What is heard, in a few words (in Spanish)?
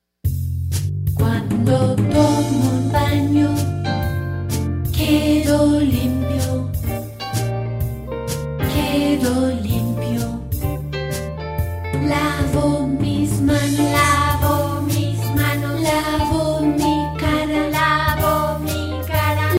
Baño, This song is sung in Sp; sung in Spanish